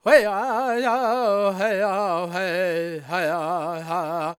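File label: E minor, World Voices, Lakota; Spectrasonics Vocal Planet CD5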